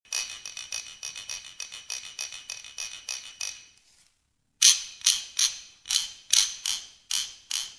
Grillenholz

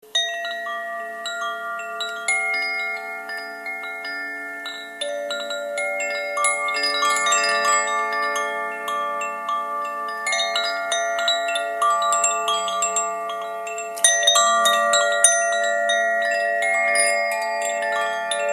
Koshi Wasser

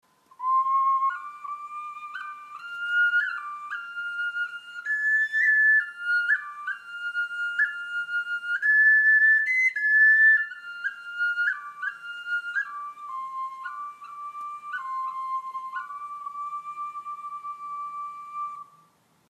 Delfin-Ocarina

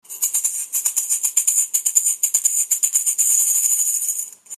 Rattan Maraca Holzkugel

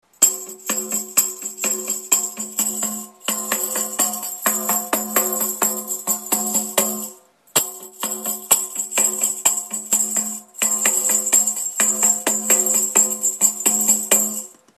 Tamburin

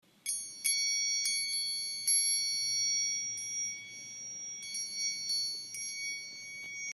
Elfenglockchen

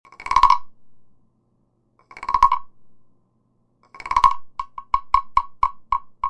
Froesche

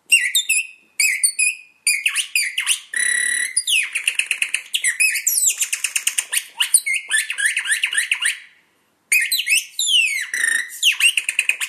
Vogelpfeiferl

